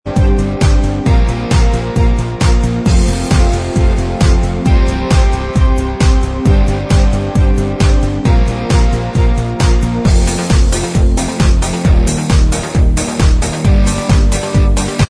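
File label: Instrumental Track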